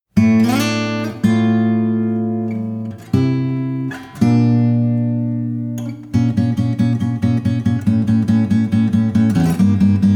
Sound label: Morris D Minor